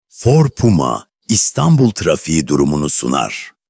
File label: trafik intro